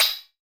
button; Dight

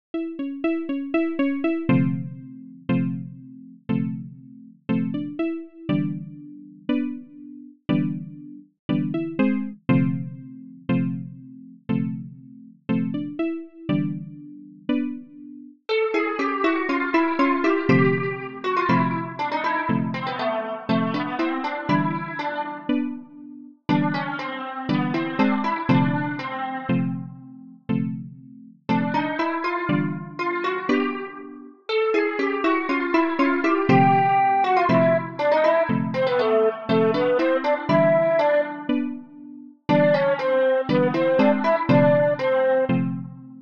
RPG town

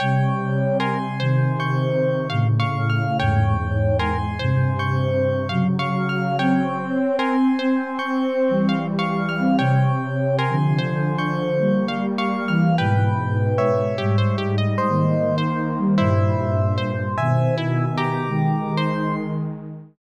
creepy maze

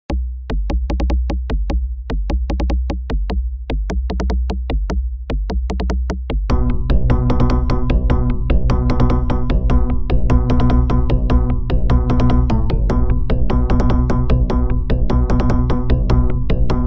Jungle Theme; Ground Floor, Please!

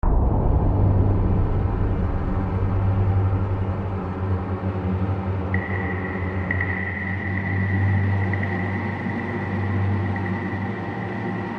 New Ambience; Die Grube

